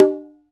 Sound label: QUINTO, Conga Battery